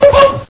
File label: hit